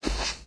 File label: footstep soft; guanyu; smite; hades